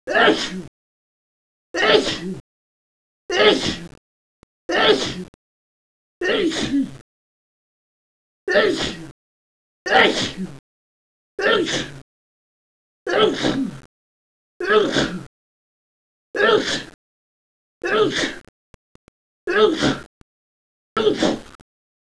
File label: rapid fire